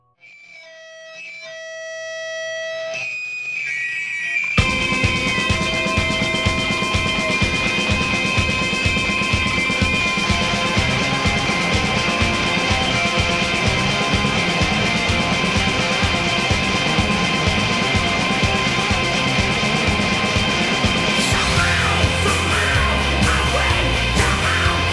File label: rock music